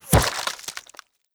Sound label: flesh bullet impact; Rust